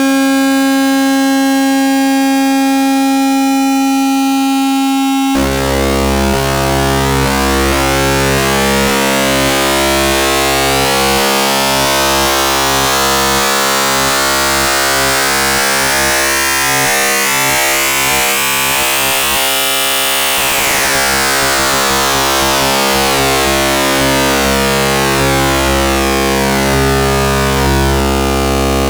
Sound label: cyclebox sine sine fm sweep